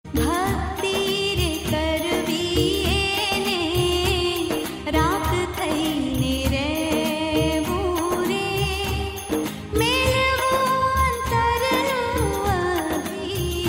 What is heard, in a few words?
durga bhajan gujrati; Navratri Special